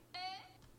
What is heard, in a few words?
ehh adlip